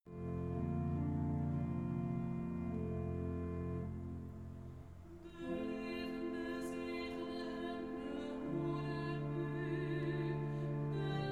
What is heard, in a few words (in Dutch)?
zegen alt; trouwkoor